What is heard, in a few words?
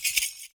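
PERC, percussions; Univers Sons - Raricussions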